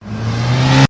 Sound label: speed coil; freak fortress 2; roblox; noob